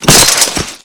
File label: Body Falls Heavy Glas